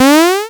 Alert, Retro Games Sound FX

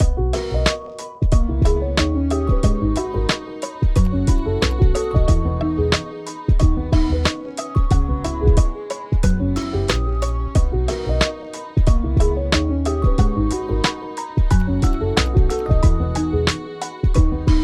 Loop, Kawaii Love Lofi Music; Kawaii Love Lofi Music Pack 2

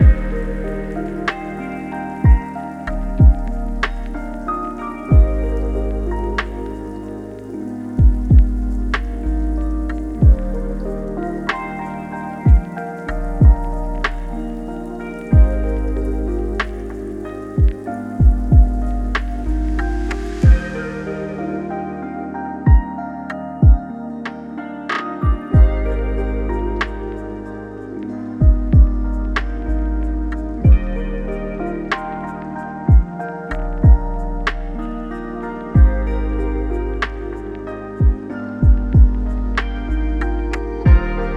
Celest FULL Loop, Kawaii Love Lofi Music; Kawaii Love Lofi Music Pack 2

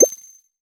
Choose Sing, User Interface Menu; Advanced UI

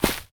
Footstep

